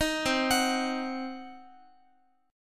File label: C Diminished C, Guitar 4A,4E,5A,5D,5G sharp,6C sharp; arpeggiated; JGuitar